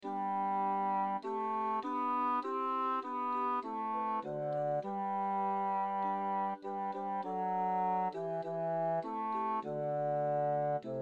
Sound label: fathom bass